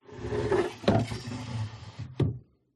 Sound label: Close Drawer, Old, Wooden, Movement, Drag; PMSFX